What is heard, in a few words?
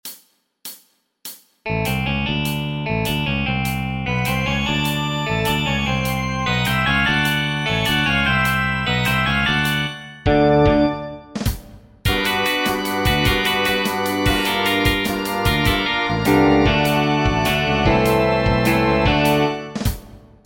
inspired drums added; Grateful Dead Inspired; 12-edo; for blues band (vocal, electric guitar, organ, bass, drumset)